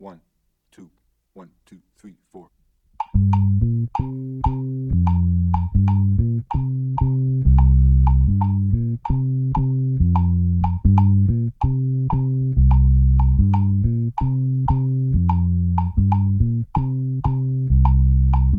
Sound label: bass guaguanco traditional 2 3 or 3 2